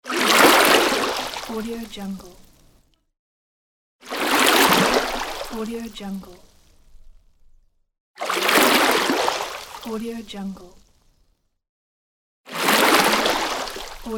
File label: rowing boat